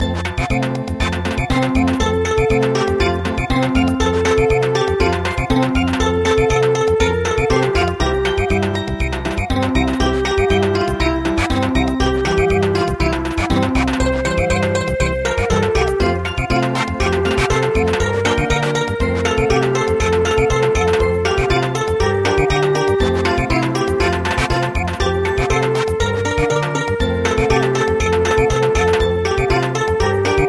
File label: Samba